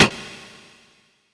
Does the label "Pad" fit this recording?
No